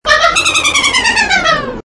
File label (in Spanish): risa